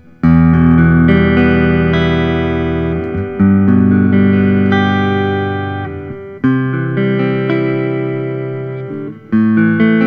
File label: paul reed smith private stock; natur